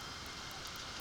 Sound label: Background Noise